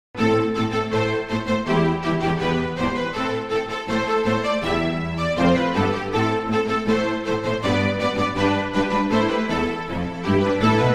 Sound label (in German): Streicher